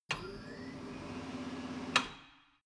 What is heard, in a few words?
tire changing machine turning platform